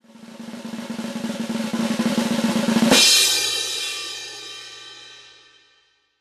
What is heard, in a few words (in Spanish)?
redoble de tambor ganador